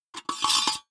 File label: Small Metal Slide